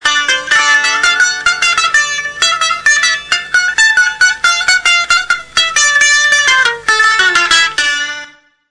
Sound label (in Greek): mpaglamas